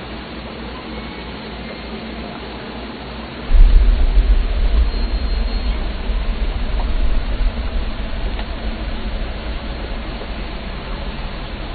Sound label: earthquake; geophony